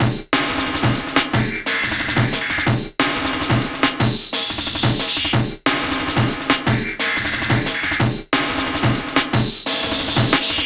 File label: Killer Beat, Drum & Bass, Beats & Breaks; Fluid Dynamics